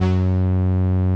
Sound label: 505, String Machines